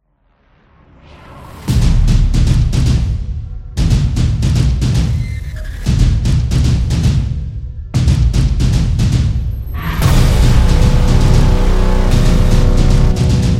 The Sport Percussion

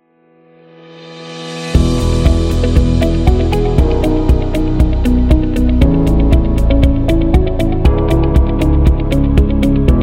Uplifting Corporate

